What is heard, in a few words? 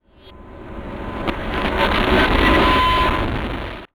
Title Transition Communication Distortion Radio; Production Element Title Transition